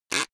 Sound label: plastic pressured soft